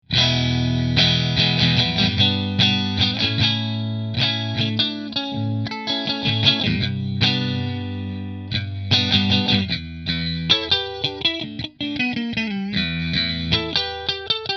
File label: Grosh Strat Position 3 Fender